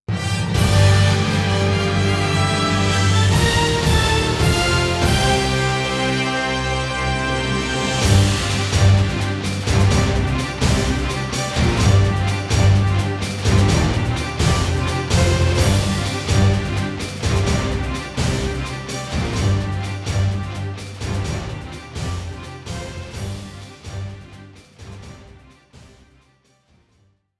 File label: WINNER'S MELODY; Shin Sangokumusou Eiketsuden Original Soundtrack